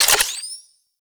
Shotgun Quick Reload Layer; Pax Duviricus

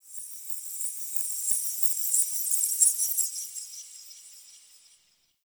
High Texture, Ambience; Warframe; The New War; Zariman

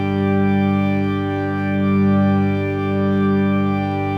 Organ G Note; Ambience; Gameplay; Warframe; Orokin Moon

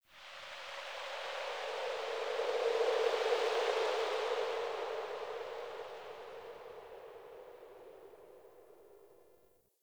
Dark Wind; Warframe; Tau

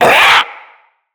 Infested Ancient Pain; Enemies; Puss Ancient

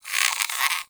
Rodent Vocal Snore; Warframe; Hunting Critters; Characters

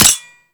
Anti Aircraft Gun Mech; Weapons; Warframe; Archwing

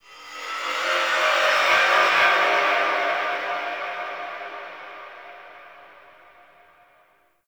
Sentient Texture Medium, Ambience; Sentient Lair

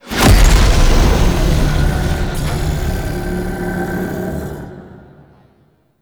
Alchemy Fully Filled Stinger; Entrati

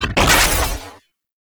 Arch Gun Primary Beam Shot; Warframe; Weapons; Archwing; Hildryn

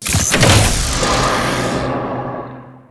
Shrine Assemble Completed; Warframe; Duviri Murmur

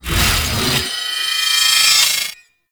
Heavy Hammer Attack Charge; Warframe; Duviri; Dax; Weapons

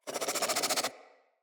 Infested Crawler Attack, Enemies; Crawlers

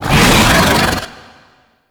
Attack Vocals; Gameplay; Golden Maw; Warframe; Ambience; The War Within

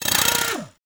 Ghoul Drill Punch; Warframe; Grineer Ghouls; Ghoul Drill Sergeant

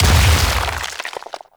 Stone Death; Warframe; Brawler

